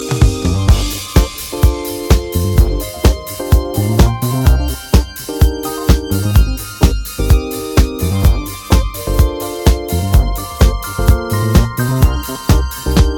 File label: Cosmic Disco Mix